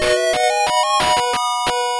Round Clear; kung fu kid master system

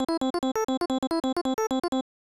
Unused Jingle; Super Double Yakuman; SNES

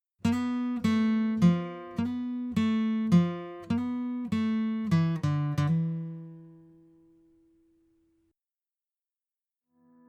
Guitar

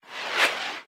Zip By

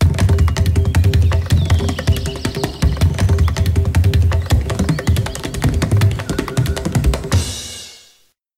Healing Wind; code name s.t.e.a.m

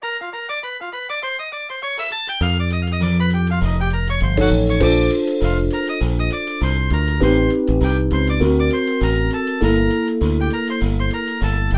Jazz; Kirihara Shoten Forest Eigo at DS; NDS

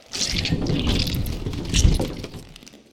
Swarm Inside Wall; silent hill homecoming 2008 gamerip pc ps3 x360

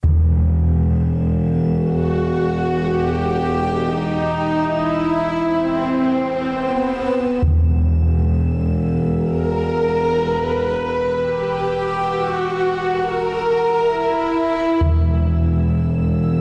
Ambience Prison Camp; inversion

